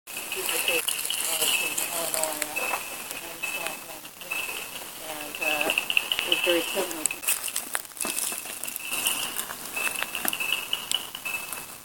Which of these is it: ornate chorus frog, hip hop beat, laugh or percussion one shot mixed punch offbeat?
ornate chorus frog